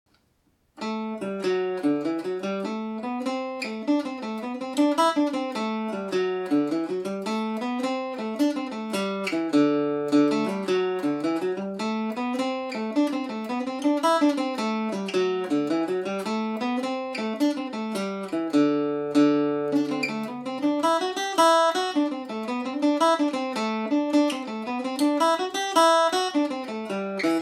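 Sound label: achreidh jig d mixolydian, mandolin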